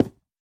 樱花木 破坏声

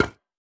饰纹陶罐踩踏声

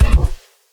骆驼冲刺声

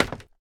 脚手架踩踏声

